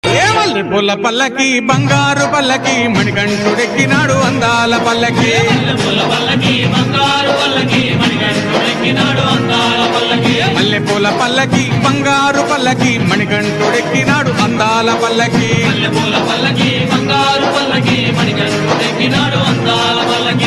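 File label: bhakti music background music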